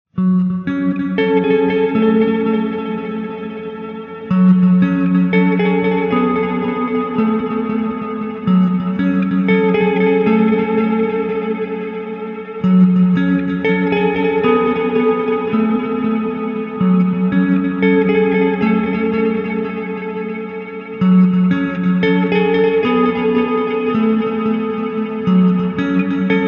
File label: desert ambience